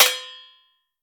Shield Impacts Sword; SNKRX

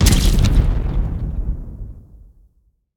Explosion Flesh; SNKRX

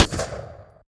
Sniper Shot; SNKRX

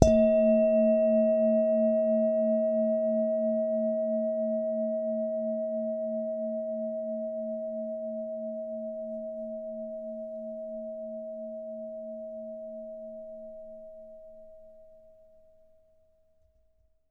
bowl; green

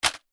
cassette drop; barotrauma-sunken-tapes

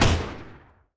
Sci Fi Machine Gun; SNKRX

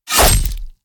Sword impact (Flesh); SNKRX

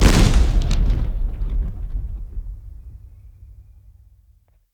Explosion Fireworks; SNKRX

